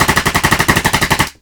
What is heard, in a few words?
9mm Uzi, gun shots; 1000fx